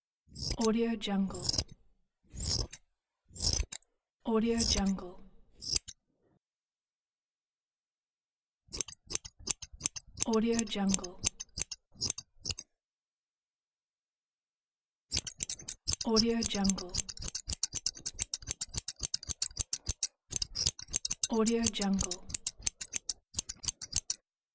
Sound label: hair cutting